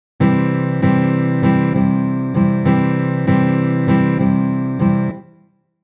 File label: stroke, guitar